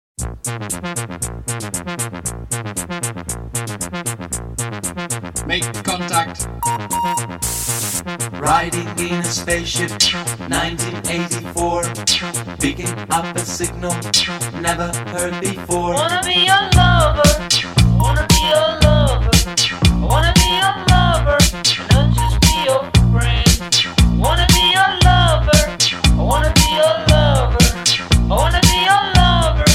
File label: transistor bass